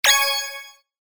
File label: break and chain